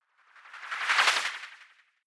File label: whoosh simple flutter futuristic high